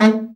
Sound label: BRA Bari, SAX; Best Service Gigapack 1; BR SAX 2